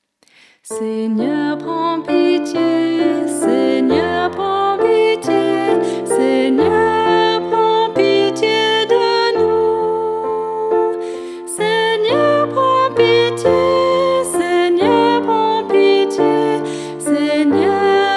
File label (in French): preparation penitentielle soprano; messe de l'agnus